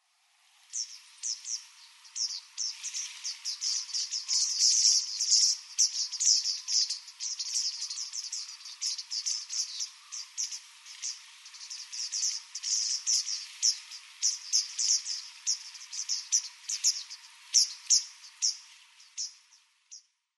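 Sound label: Japanese Wagtail; utsunomiya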